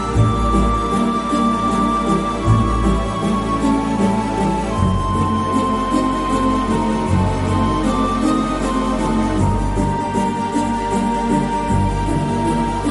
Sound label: AVE MARIA INSTRUMENTAL